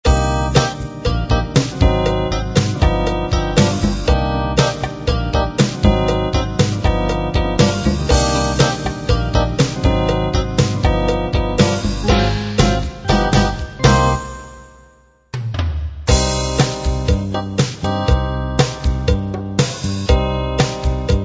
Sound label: Latin Pop